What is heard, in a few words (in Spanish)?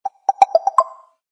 tono mensaje